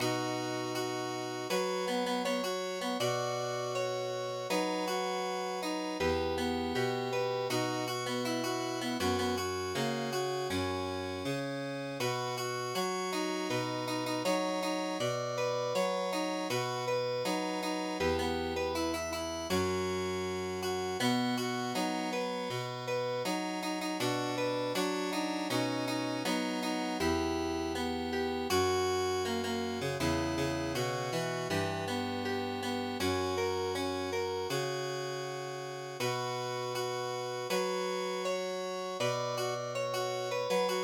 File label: cembalo; koujo